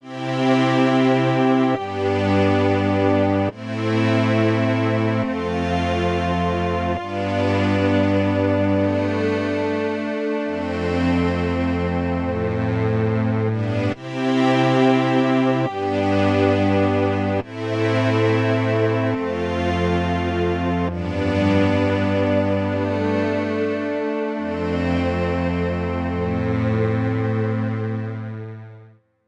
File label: strings one